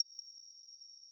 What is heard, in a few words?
arp